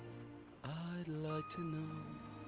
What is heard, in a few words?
female laugh